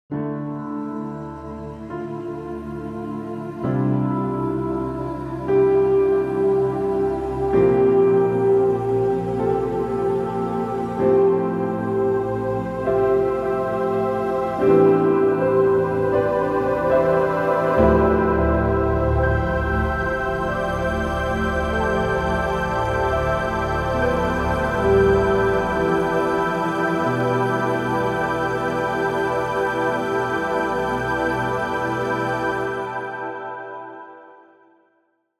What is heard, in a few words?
spherical piano pad